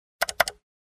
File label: Double key press on keyboard